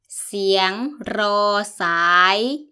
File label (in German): warteton